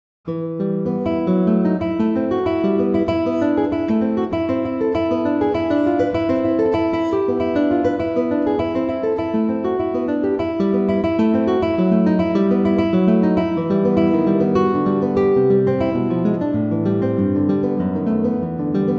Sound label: prelude, guitar; pianoteq8